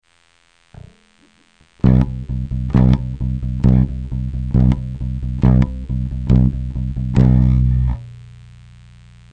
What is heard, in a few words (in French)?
accent, bass; main droite